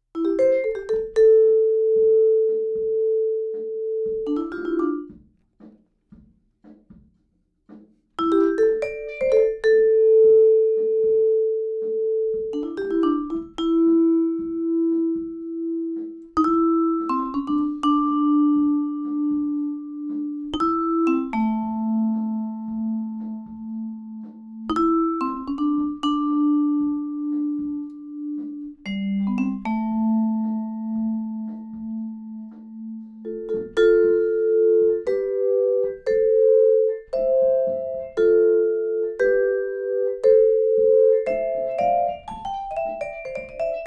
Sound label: Vibes